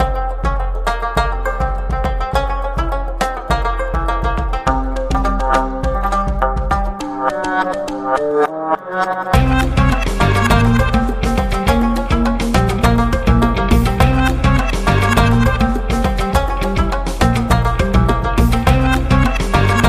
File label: Arabesque Agents